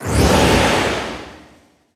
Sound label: charge; haunt